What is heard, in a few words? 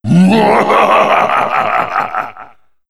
knight laugh; tf2; halloween boss